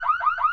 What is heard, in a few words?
Car Alarm Oscillating; Alarm Sounds